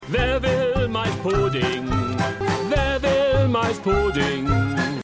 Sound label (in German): Maispuddingkoch Gesang; Schmigadoon